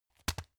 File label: tissue package drop